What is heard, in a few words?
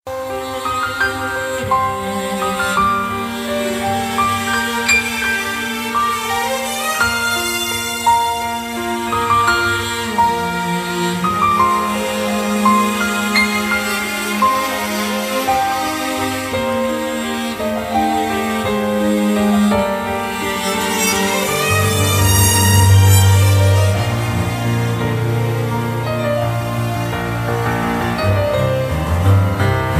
piano Live; GoT